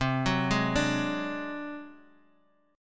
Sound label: G sharp 7th Flat 5th C, chord